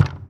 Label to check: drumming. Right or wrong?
Wrong